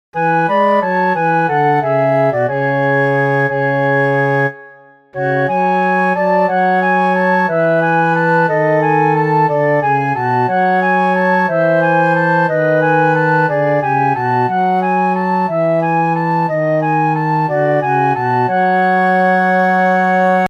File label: Filles fin tutti